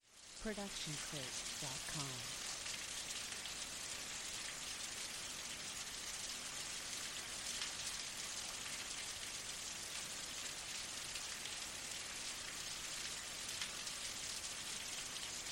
Rain Pouring Outdoors; soundscrate

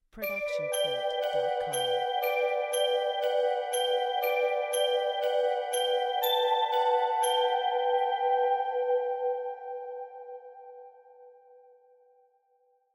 christmas bells jingle; soundscrate

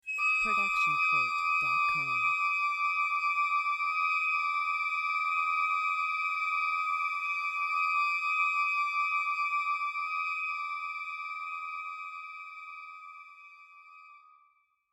high cluster sustain

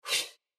woosh

